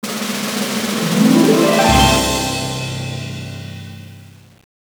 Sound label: quest complete